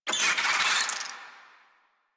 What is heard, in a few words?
Infested Ashen Vocal Attack Death; Warframe